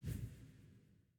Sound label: Top Bar Activate